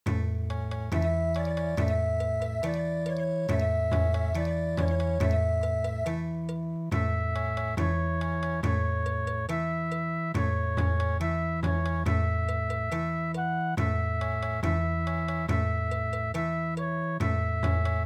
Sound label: Bon Odori; gion bayashi